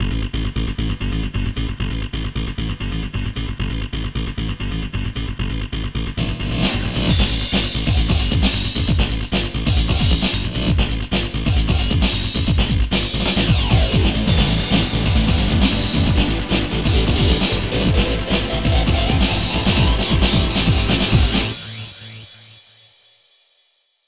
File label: Instrumental; Tekken Tag Tournament; arcade; Attract (Arcade Version Instrumental)